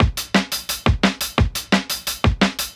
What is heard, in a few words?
DNB BREAK